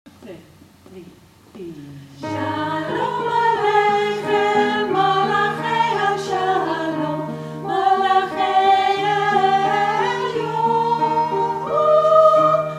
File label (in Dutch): shalom sopraan